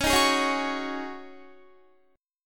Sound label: Db Suspended 2nd Suspended 4th Db Guitar